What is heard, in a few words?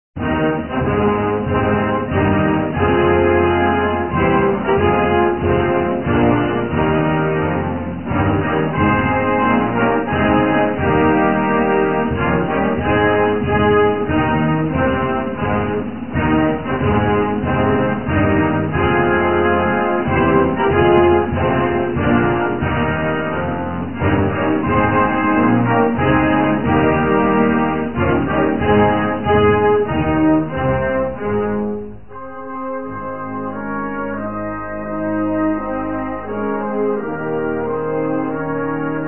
Star Spangled Banner, National Anthem Instrumental; July4